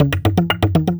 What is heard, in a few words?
Hand drums; CASTELLIN